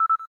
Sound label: cam timer last